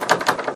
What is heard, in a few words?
door locked, vehicles